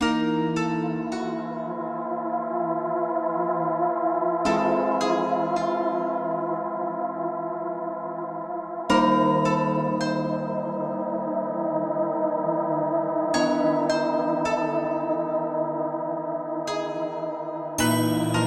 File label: japanese horror; castle ruins